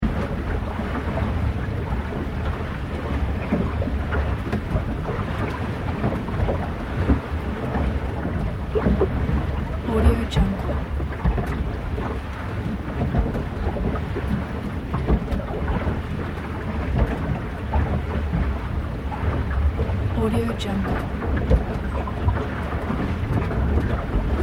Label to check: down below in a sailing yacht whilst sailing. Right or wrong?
Right